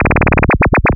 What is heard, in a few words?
LFO, Noise; Sonic Foundry (Sony Creative Software); Prototechno